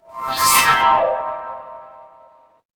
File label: magic scifi teleport